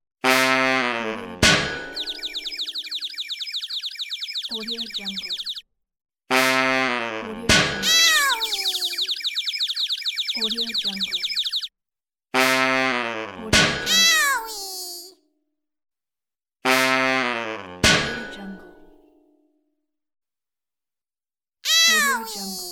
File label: cartoon fail with head impact